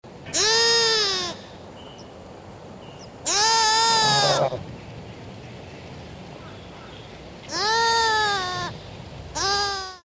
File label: lambs eat